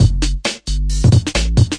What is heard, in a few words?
133 bpm, bass crawl electro hop, drum loops and breaks